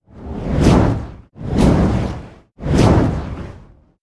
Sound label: fire Reel Climb; netent